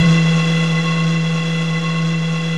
PERC BOTTL; E-MU Formula 4000 Series Vol. 2

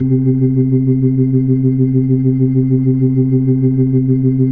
C3F, B3

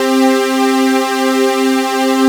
SUPER SAW PAD, ROLAND MKS-80; Rubber Chicken Software Sample Bank

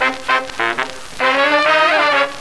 Old Scratchy Sect, Effected Horn Section

